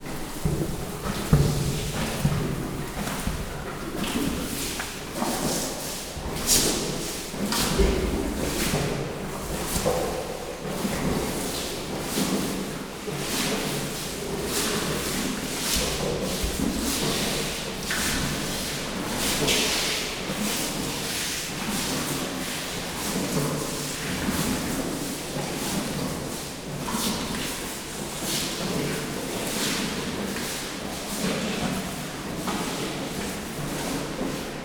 ebédelnek, nílusi víziló; pecsi zoo 2017

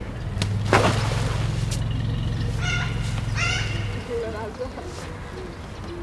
mély hangot hallat, ázsiai elefánt; állathangok; nyíregyházi zoo 2010

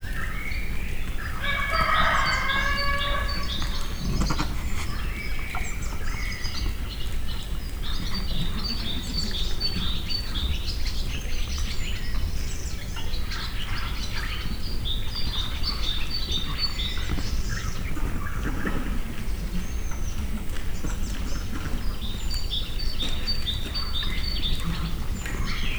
háztáji kakas birka fecske, parasztudvar; állathangok; miskolci zoo 2009

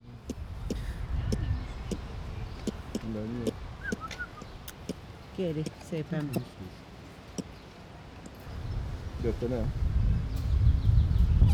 beszéd is szél, gyűrűsfarkú maki; allathangok; veszpremizoo 2013

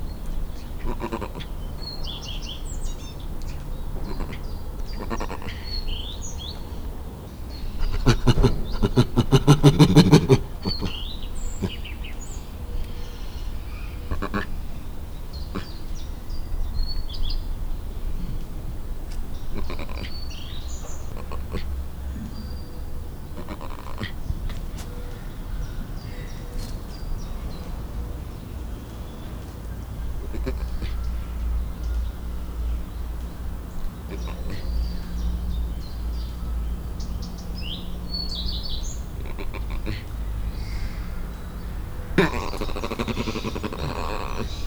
beszedes kedveben, emse makako; debreceni zoo 2012; marantz puska

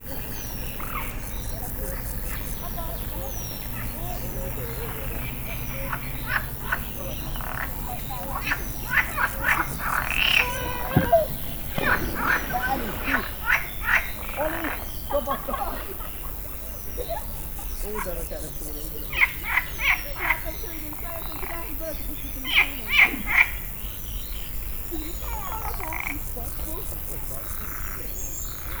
kettő tavi béka sztereóban, béka tavi; Tiszababolna; termeszetben

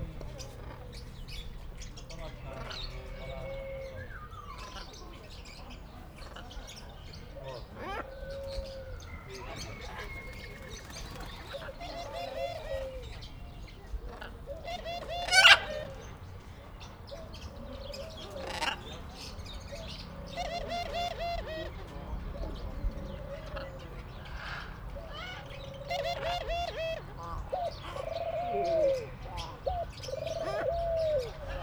mas madar is, kacago gerle; állathangok; nyíregyházi zoo 2010

